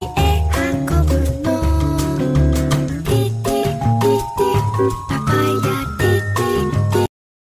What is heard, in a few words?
Cute Cartoon Ringtones